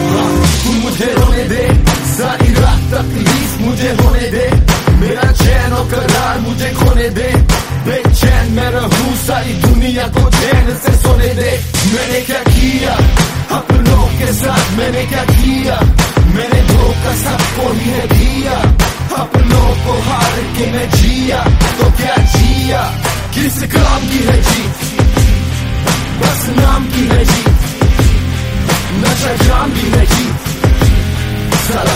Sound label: Sad Ringtone